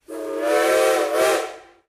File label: TRAIN STEAM WHISTLE